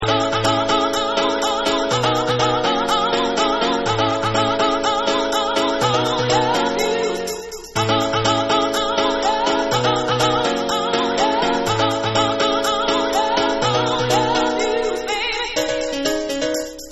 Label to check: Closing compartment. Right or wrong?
Wrong